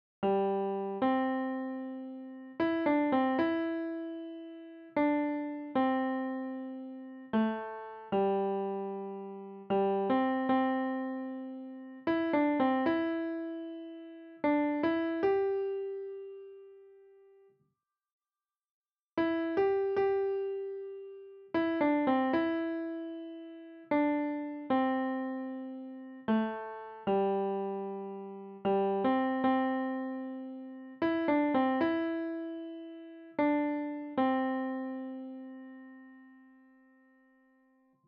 Amazing Grace Klavier, für Klavier; englisches Traditional für Klavier, sehr leicht; Amazing Grace - für Klavier, sehr leicht mit Aufnahme

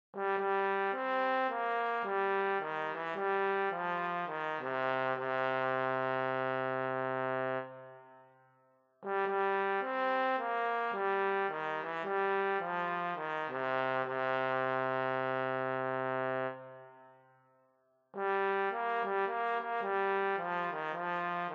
Posaune solo

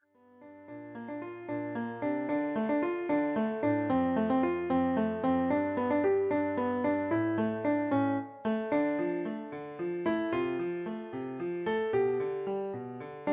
The House of the Rising Sun, Klavier

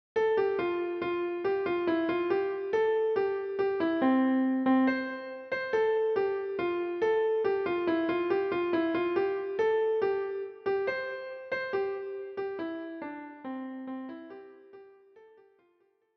Der Vogelfänger Klavier; sehr leicht; Der Vogelfänger bin ich ja; Schwierigkeitsgrad 1

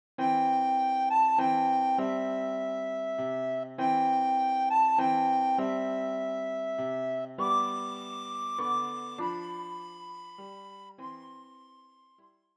Stille Nacht heilige Nacht, für Sopranblockflöte und Klavier; Schwierigkeitsgrad Klavier Level 3, leicht

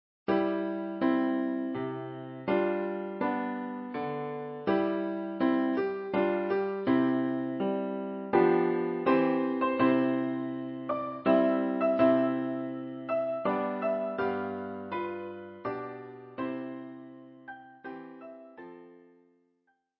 O Tannenbaum

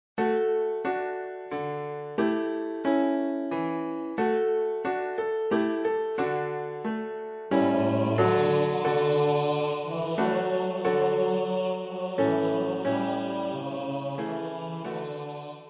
O Tannenbaum

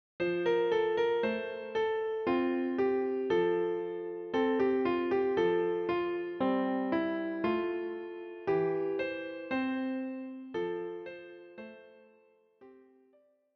Fröhliche Weihnacht Klavier; Weihnachtslied für Klavier; leicht; Fröhliche Weihnacht überall - für Klavier, leicht mit Aufnahme

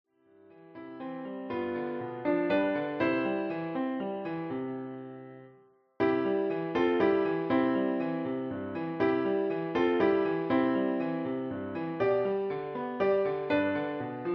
Klavier

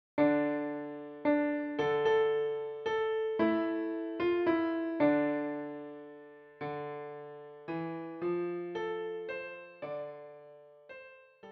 Klavier

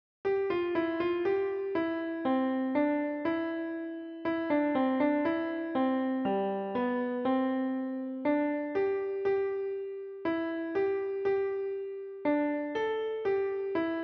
Fröhliche Weihnacht Klav

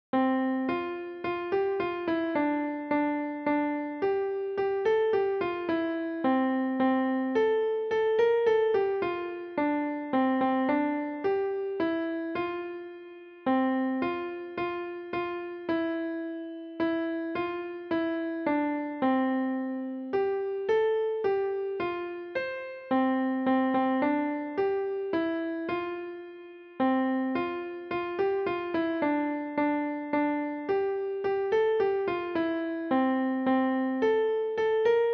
We wish you Klavier; für Klavier, sehr leicht; englisches Weihnachtslied für Klavier solo, sehr leicht

